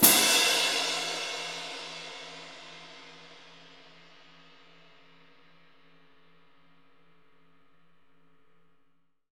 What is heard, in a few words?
CYM CYMB, Orch Crash; Roland LCDP03 Orchestral Perc; Orch Cymbals